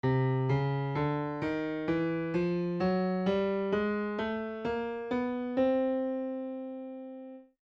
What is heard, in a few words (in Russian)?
hromaticheskaya gamma; Лады народной музыки, пентатоника и хроматическая гамма